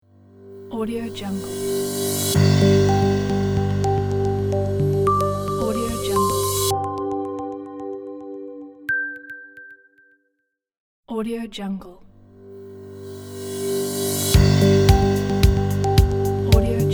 educational logo pack

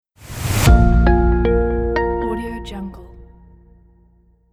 bright guitar harmonics logo reveal

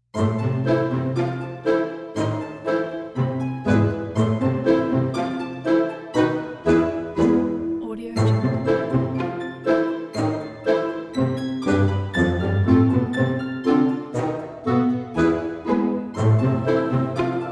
halloween

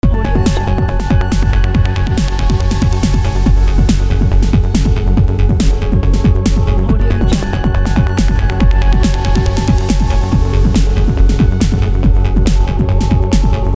high technology loop

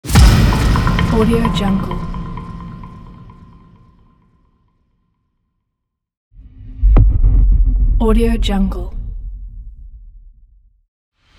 powerful hit pack

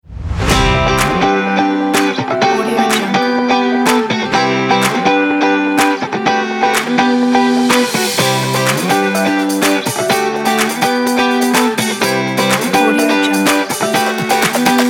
happy kit

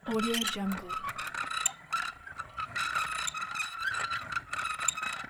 metal screw squeak; stormwaveaudio

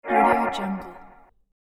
electronic ambient video game exit menu sound

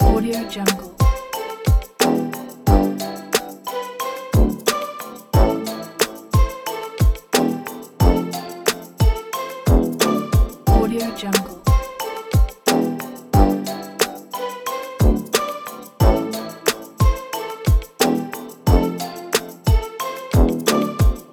children game background loop

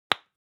object hygiene lipstick open hard

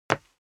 step dress boots wood parquet slide short; foley; smartsoundfx

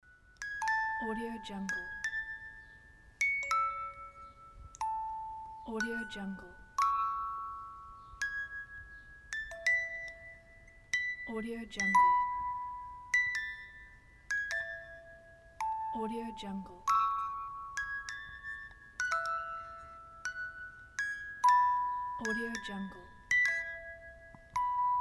music box

